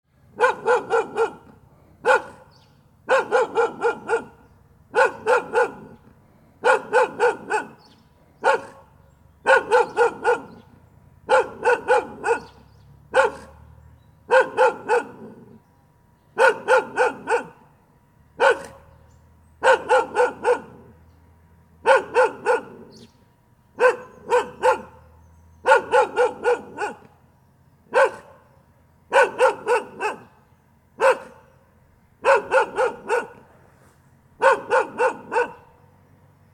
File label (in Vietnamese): Nhạc chuông tiếng chó sủa